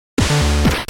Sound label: white noise storm